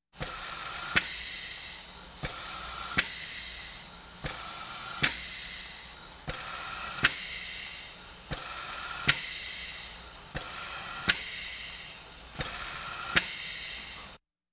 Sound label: Hospital effects